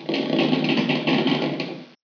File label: creak